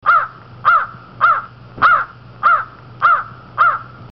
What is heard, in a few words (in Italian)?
cornacchia, suono verso della cornacchia; Corvus corone